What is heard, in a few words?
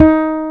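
fretless bass D4; AKAI S-Series CD-ROM Sound Library VOL-2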